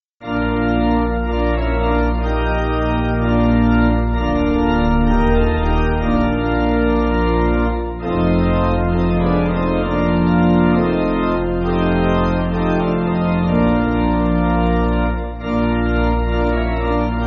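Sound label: Pipe Organ, Music Backing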